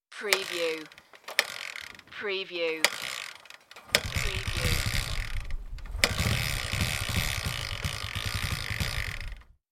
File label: No Start Dirt Bike